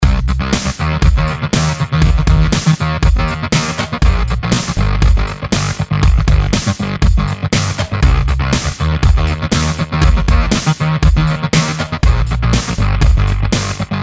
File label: Progressive House; Big Fish Audio